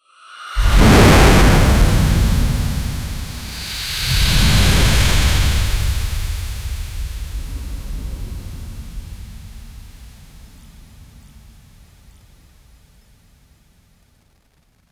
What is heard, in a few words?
short dragon breath attac